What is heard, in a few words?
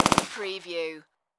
Machine Gun MP40 Short Burst